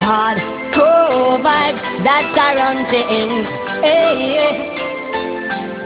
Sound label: bbox